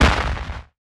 asteroid damage large; Factorio

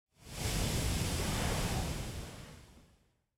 lava; factorio

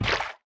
mech armor land semi wet; Factorio

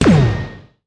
railgun gunshot; Factorio

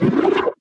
stomper death, enemies; Factorio

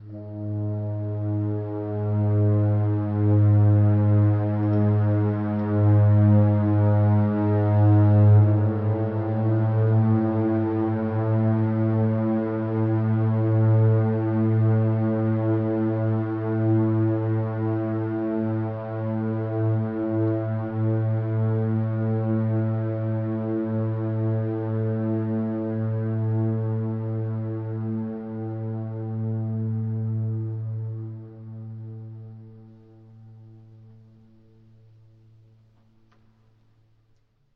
ambient, vulcanus; Factorio - Space Age; Vulcanus interlude 1

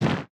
mech armor land bark; Factorio

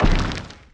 asteroid collision metallic small; Factorio

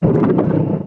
stomper idle big, enemies; Factorio

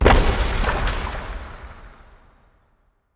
asteroid damage oxide huge; Factorio

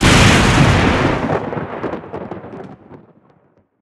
lightning effect; Factorio

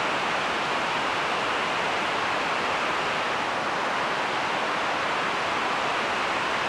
vehicle surface snow, driving; Factorio

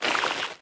wriggler walk; enemies; Factorio

